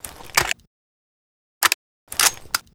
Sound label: lr300 reload; STALKER